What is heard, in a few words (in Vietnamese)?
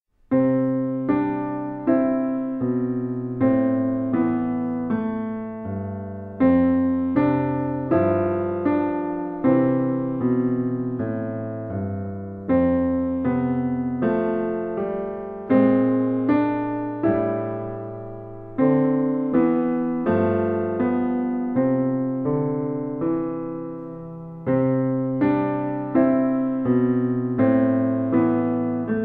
Beat